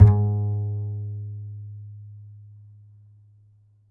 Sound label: A, upright acoustic bass, A string